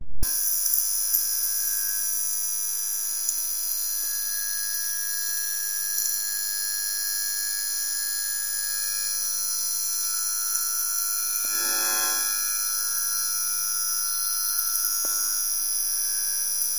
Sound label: ULF VLF